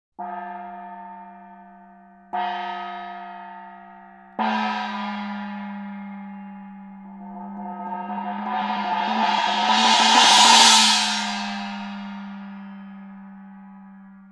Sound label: gong